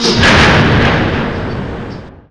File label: machine stop; Half Life Model Pack; Half Life 2; ambient levels